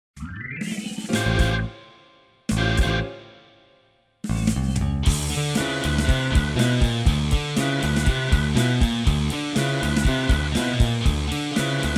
alto

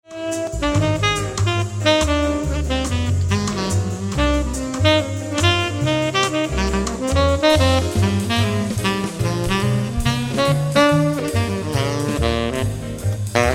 tenor

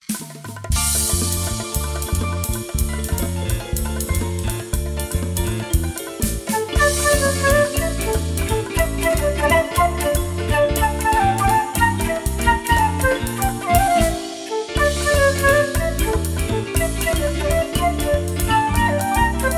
Flute

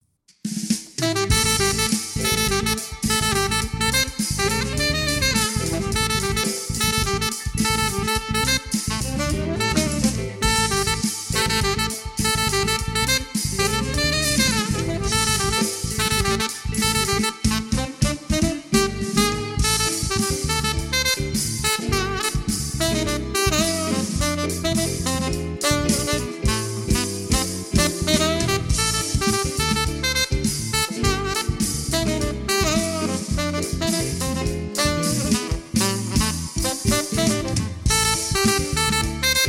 Tenor, sax

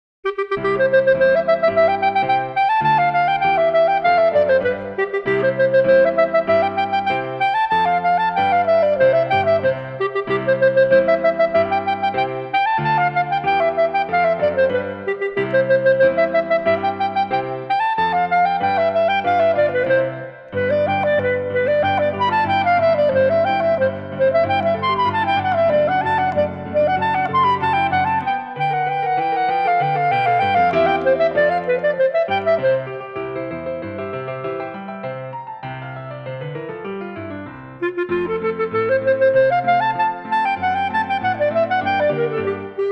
Allegro on an Arpeggio Clarinet

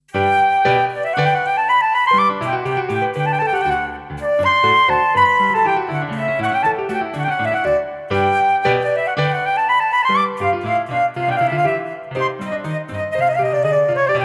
suite for flute flute

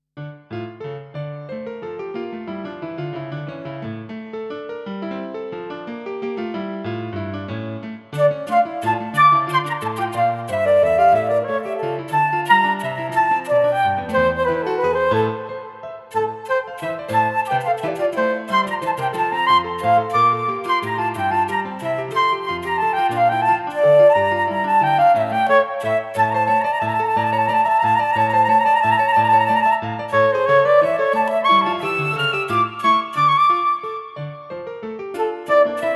flute

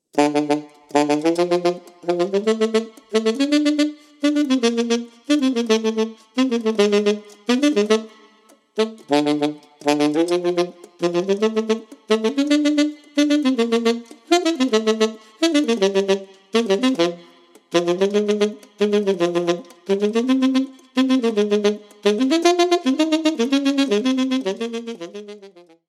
alto sax

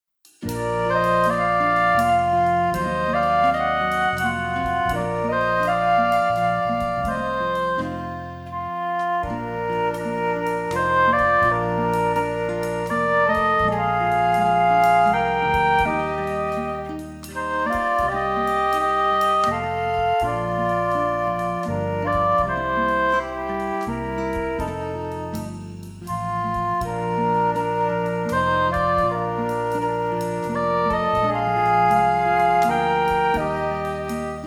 FLUTES WITH RHYTHM SECTION

